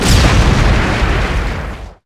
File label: Explo Medium; Destruction